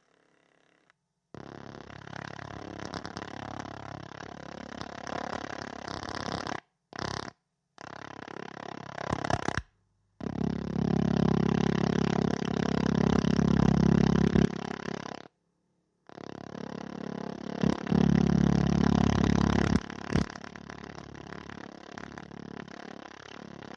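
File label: zapis massazhnogo pistoleta s proigrivatelem, recording of a massage gun with a player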